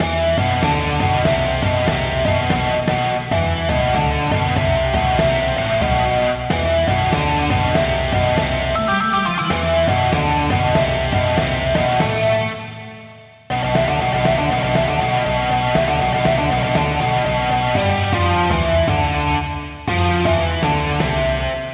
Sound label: rock theme song loop